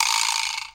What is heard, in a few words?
vibraslap; Misc Percussion